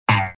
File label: twang